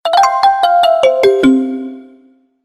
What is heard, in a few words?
SMS Good News; MIUI14 and HyperOS 1.0